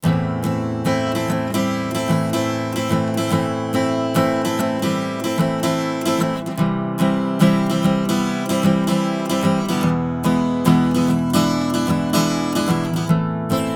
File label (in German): Gitarre; Tegeler Audio Vocal Leveler 500; ohne VL 500